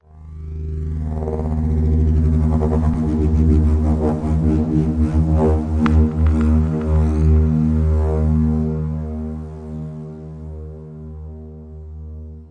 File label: approaching spindown synth